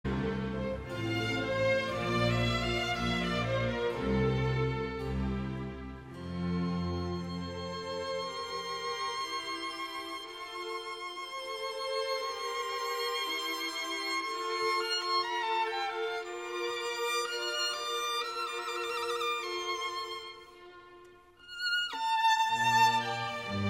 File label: Violin Concerto; Prague Dvorak Chamber Orchestra